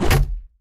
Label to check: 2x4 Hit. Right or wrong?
Right